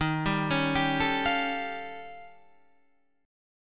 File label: Eb 9th Flat 5th, Guitar, Standard; Eb9b5; arpeggiated; 11,10,11,12,10,13; JGuitar